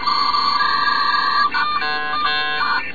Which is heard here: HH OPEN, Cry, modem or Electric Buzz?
modem